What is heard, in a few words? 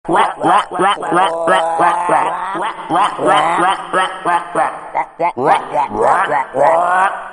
Funny Frogs